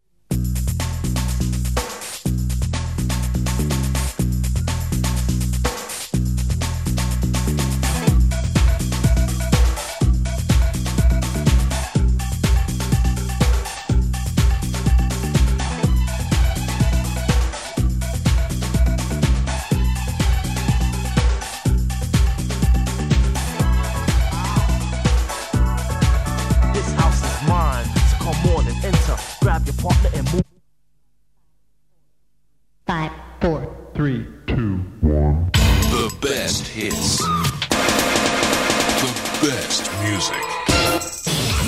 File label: Jingles; 40 Principales